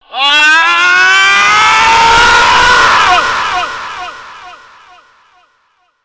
scream

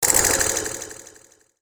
frosttouch freeze

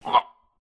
hurt

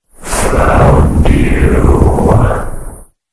teleport; Sonic.exe

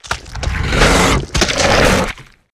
yeti eat; tf

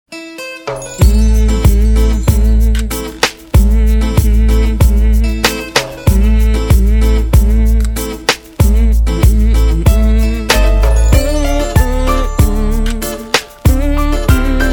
riff

